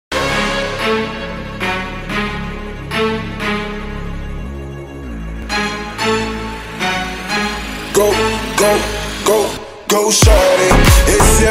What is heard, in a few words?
remix bgm